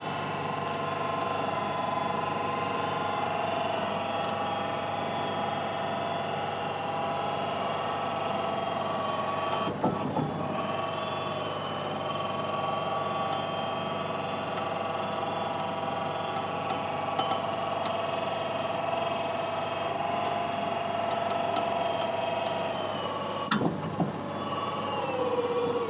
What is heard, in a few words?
paranal AT dome moving; soundscape